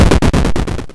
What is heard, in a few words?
hardhit